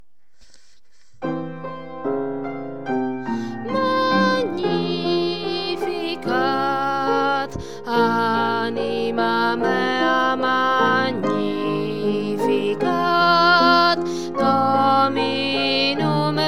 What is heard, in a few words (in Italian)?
magnificat natale bassi